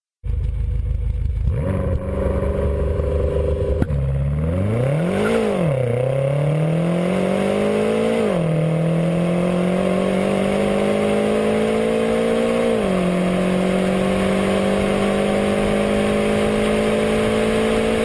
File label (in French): Harmoniques